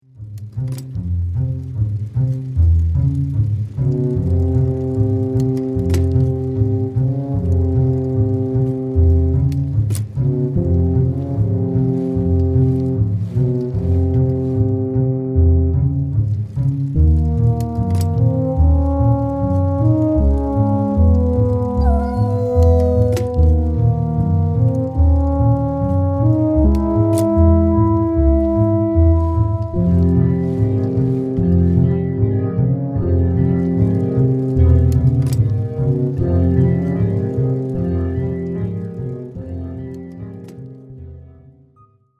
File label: Sad Bike Ride